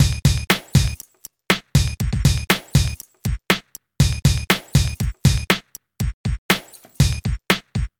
beat; Sonic Foundry

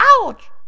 hero damage; diver jimmy

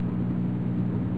Electric; U-Boat 1.5.3

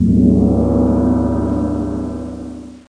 Gong

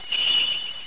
jingle; Rayearth

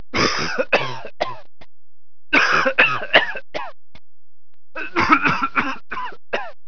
cough; 8bit

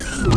ERROR

